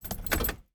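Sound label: car key ignition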